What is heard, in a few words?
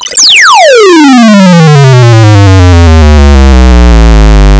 Stimulus, Acoustics Test, Mic; Labview-Program